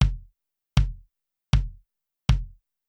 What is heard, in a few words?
kicks 10ms silence